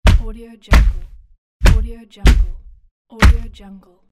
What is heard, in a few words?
thud